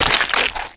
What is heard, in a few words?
eat; perro